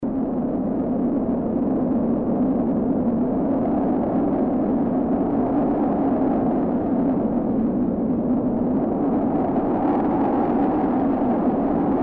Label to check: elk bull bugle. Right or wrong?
Wrong